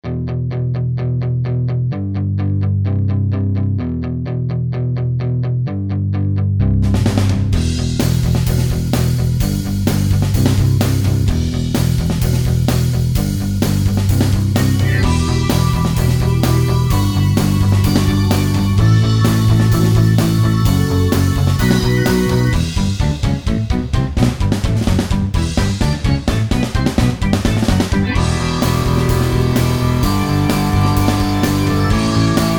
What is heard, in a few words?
bgm